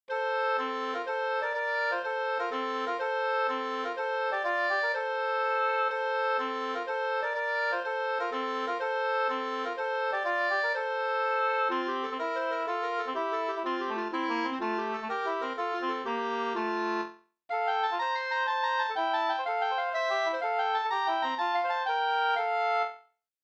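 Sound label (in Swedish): polska, tvåstämmig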